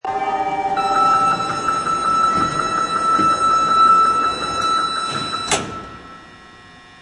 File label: close, door